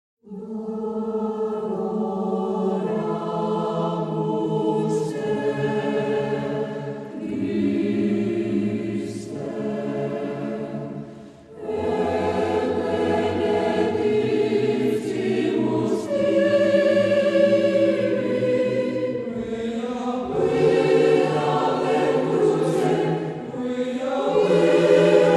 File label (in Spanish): adoramuste (lautada); PEDRO AIZPURUA